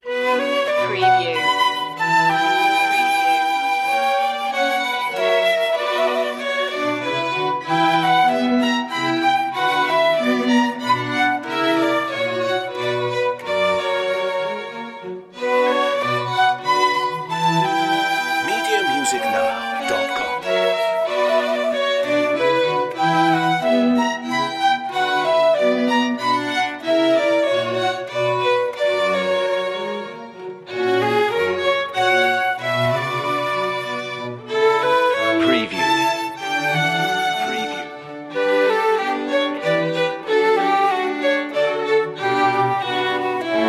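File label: menuet orchestral suite no 2 b minor chamber orchestra; jsbach; bwv 1067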